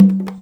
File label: CONGA, Percussion; InVision Interactive; Percussion Slam